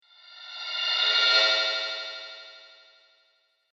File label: Future Motion, Science Fiction Sound Effects